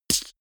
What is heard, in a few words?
beatbox snare